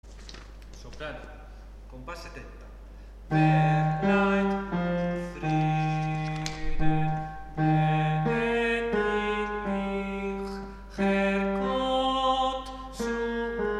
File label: motete mendelson sop